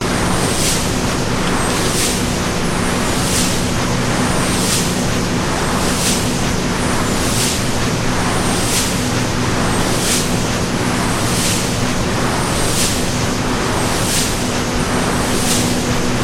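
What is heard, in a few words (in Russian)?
звук ветряной электростанции ветро-генератор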